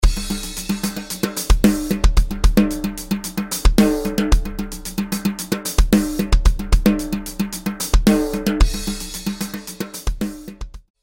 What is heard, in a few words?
snare Rods Snare Off; Ahead with Rods; BFD拡張音源; fxpansion; snare selects